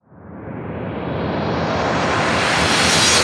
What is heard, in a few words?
Uplifter